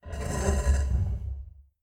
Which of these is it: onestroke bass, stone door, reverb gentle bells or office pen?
stone door